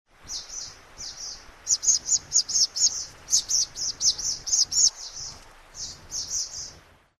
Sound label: brown bellied swallow